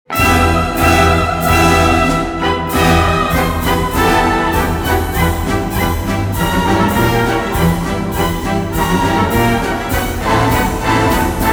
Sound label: March; Egyptian